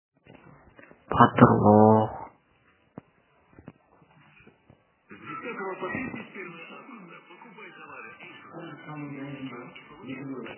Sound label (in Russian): zvanok